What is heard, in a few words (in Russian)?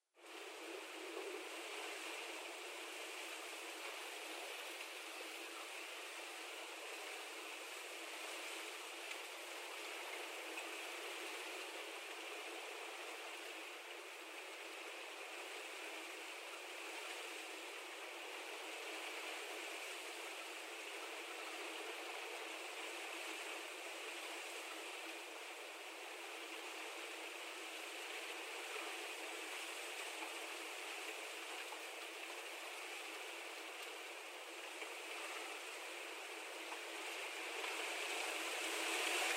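ветер на 37 сек усиливается; Звук ветра и дождя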